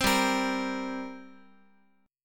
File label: G Minor Double Flat 5th, G, Guitar; strummed; JGuitar